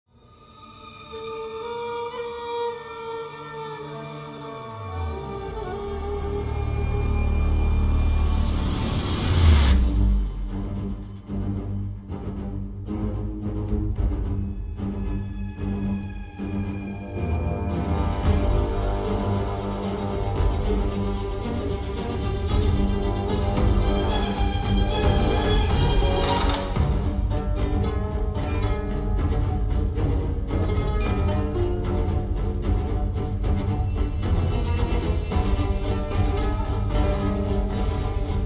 Piano version

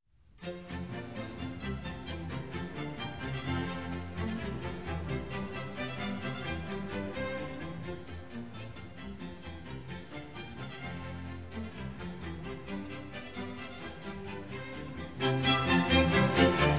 Water Music Suite in D Major; HWV.349

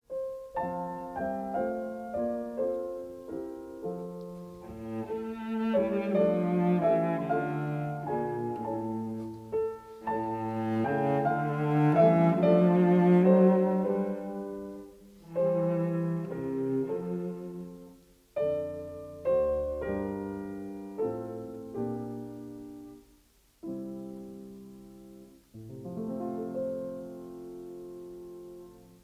Fantasy In F Minor; Schubert; D. 940 (Op.103) For Piano Duet

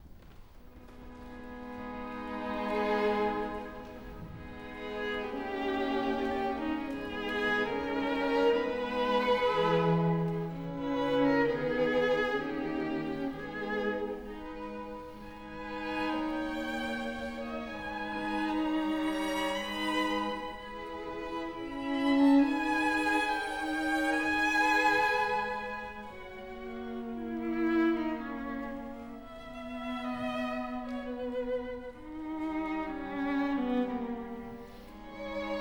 String Quartet in C-Sharp Minor Adagio; Op. 131 VI.

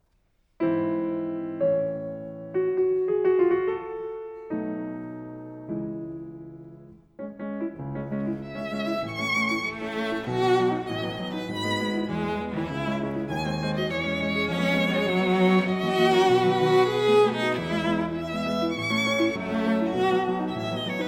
Piano Trio in E Minor, Andante; No. 4; Op. 90, B. 166 Dumky III.

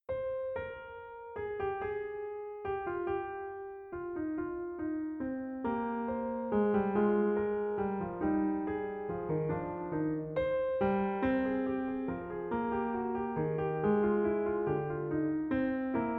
Piano Sonata in C Major Allegro; No. 16, K. 545 I.

